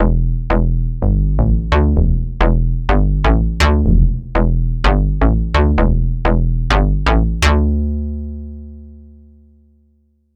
yamaha fm synthese spectral odd skirt

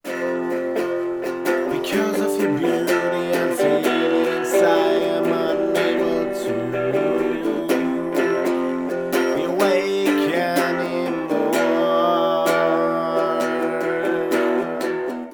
vergleich gesang gitarre; fluid audio strum buddy test

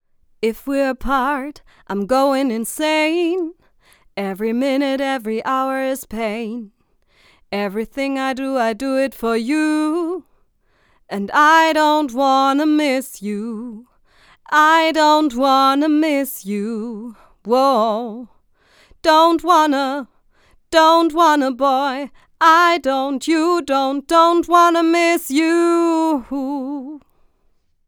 gesang; mojave ma-1000

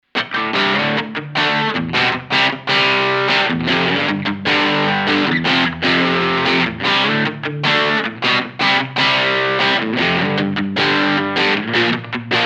Le Crunch Kanal AB hot Strat Steg PU erst nur B dann AB analoge; two notes; speaker sim

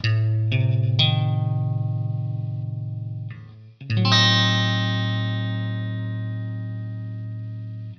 a vermindert

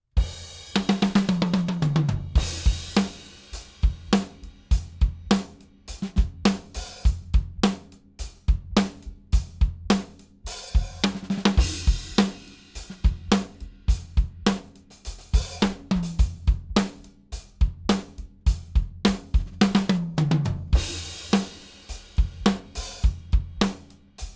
rock klangbeispiel; Presonus DM-7

